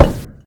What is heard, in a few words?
Wood Stone, collision sounds; opensim; CollisionSoundsAssetSet